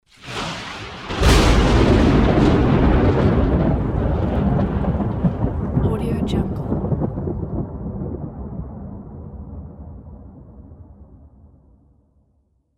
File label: lightning and thunder